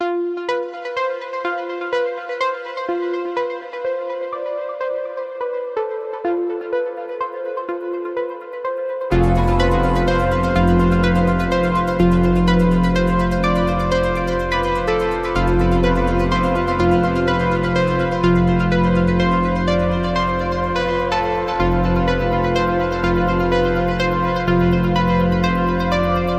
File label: Analog Anticipation